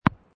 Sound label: Tennis Ball Bouncing on Court Soft; Sports